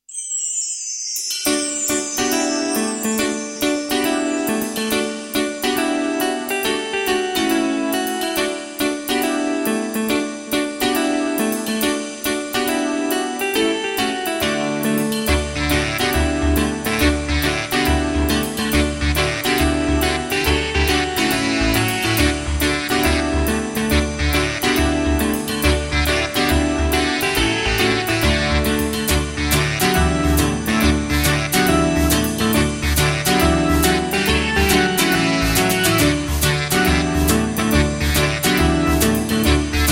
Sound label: striptease; Desvistiéndose